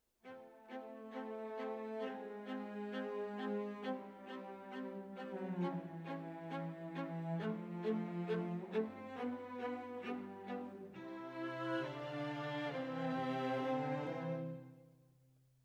Violas, jazz for piano

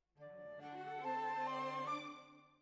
Oboe

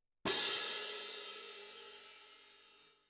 Perc

